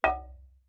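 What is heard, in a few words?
Marimba deadstroke fortissimo C2; MIS Pitches - 2014; Percussion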